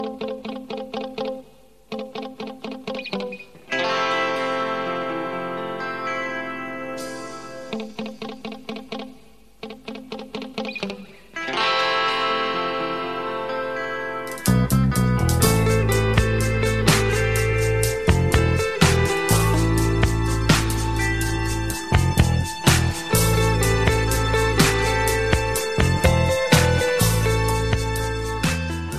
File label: delicate guitar